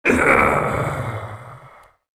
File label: death, voice; garrysmod